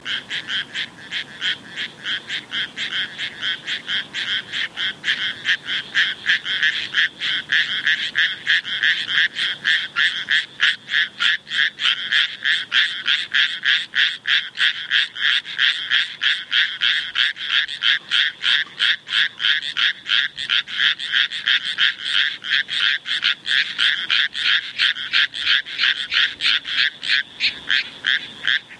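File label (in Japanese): amagaeru, Japanese tree frog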